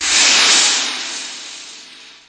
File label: long rev thing; Weird and Interesting Hits